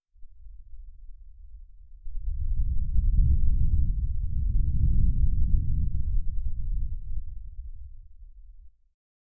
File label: deep rushing wind bass, flutter